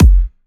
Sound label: 130BPM Kick; Vengeance Samples; Worship Kit